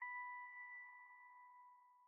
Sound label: City Reprise Stinger